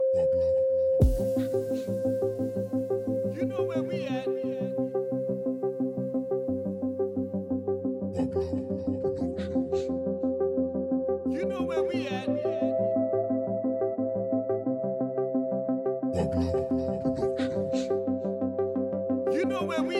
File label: POP EDM